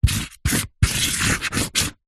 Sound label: marker pen highlighter luminous draw diagram on cardboard